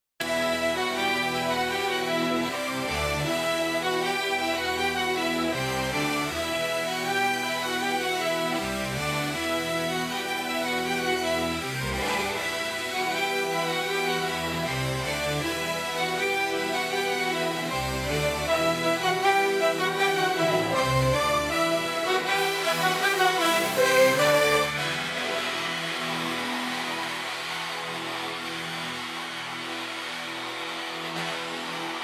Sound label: Synth